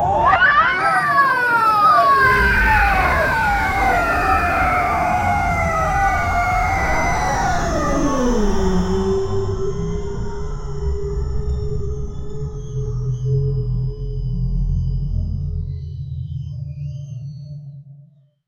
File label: the aliens fly away from